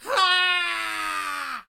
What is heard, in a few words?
Goblin Scream; SyrinScape